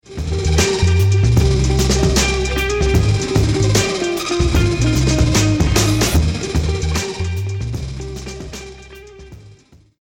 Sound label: lost round, music